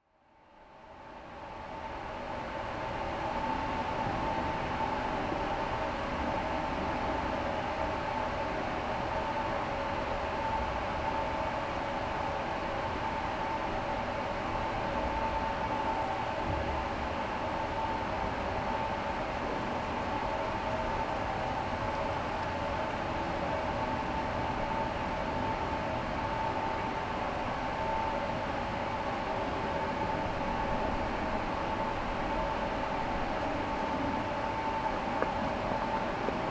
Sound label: pipes train